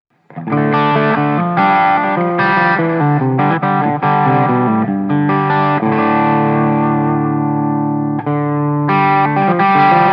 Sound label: Mooer Tube Engine PoT